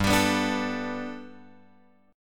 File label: G Major Add 9th chord; Gadd9 Chord {3 X 5 4 3 5} strummed